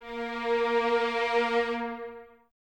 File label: Violins Detache A#3; Miroslav Vitous; 11 Violins